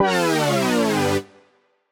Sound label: Poly Chord Hits, Ramp Down, G; JPEGG; future rave